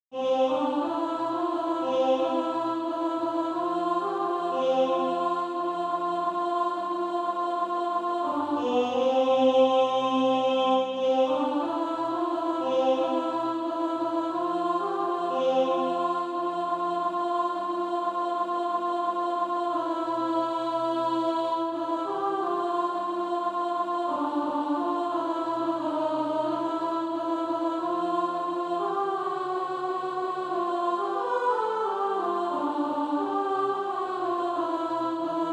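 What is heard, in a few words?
alto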